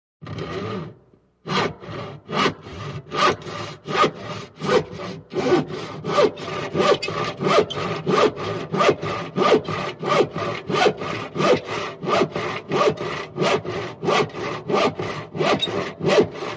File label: Handheld Saw Fast Rev, saw